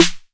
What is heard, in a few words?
808 SD Tape Sat